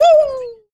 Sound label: "woo", voice